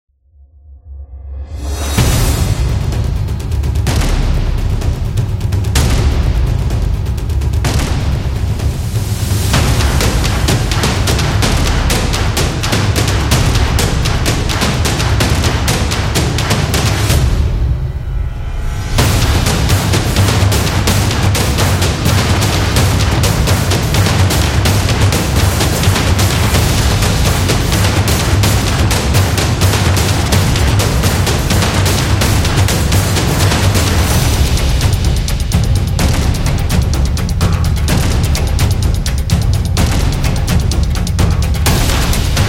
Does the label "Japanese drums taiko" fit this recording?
Yes